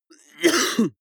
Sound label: cough male single attenuated; koerpergeraeusche